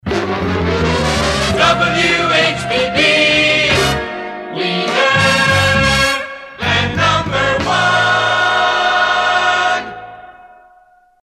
Pick Hit; WHBB; PAMS

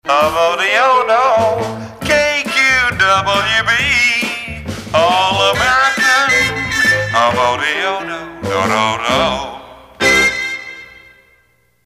Winchester; KQWB; PAMS

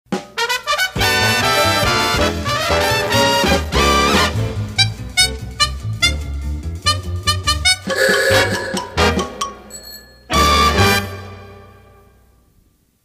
Antique Horn Instrumental; PAMS Numbered Series; KXOA; Sig

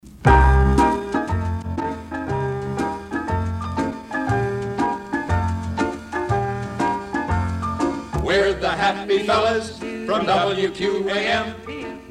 Money Match Promo Sung by DJs; WQAM

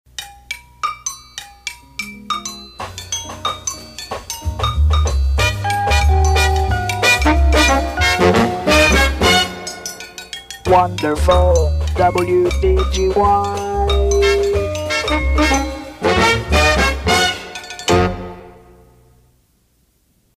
Cowbell Latin Sonovox; PAMS Numbered Series; WDGY

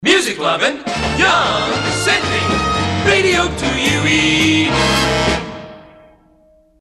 For Openers; 2UE; PAMS

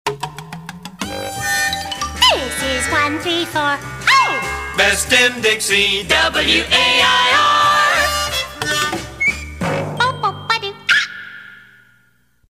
Hip-Tee-Doo; WAIR; PAMS Numbered Series

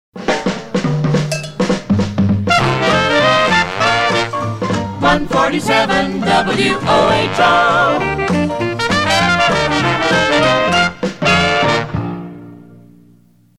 Dixieland; PAMS

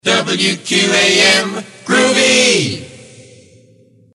Moog; WQAM; Gwinsound

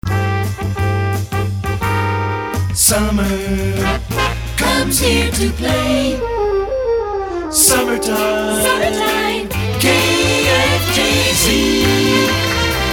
Summer Promo; KFJZ; PAMS

